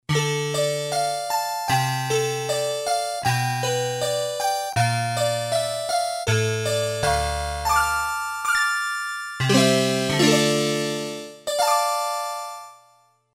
Metallic Sound, Casio CSM-1; Tones

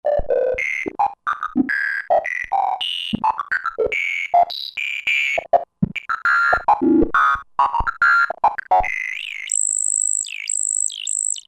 Booster Bips, Roland JV-2080

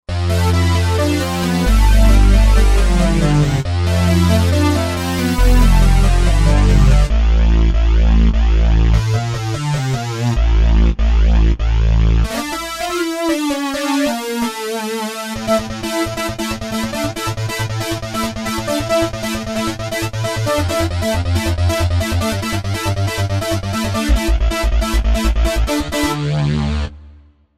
Wide Open Synth, Korg Wavestation

